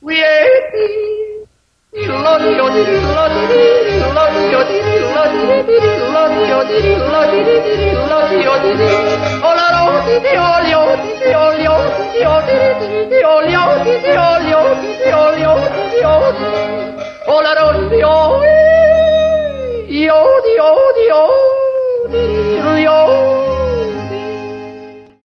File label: funny old yodel song